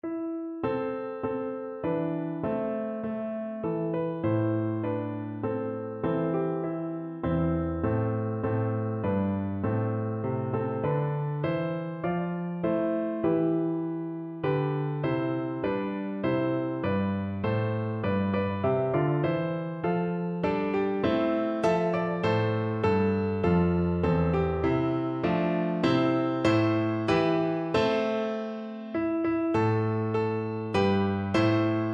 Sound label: spanish ladies, piano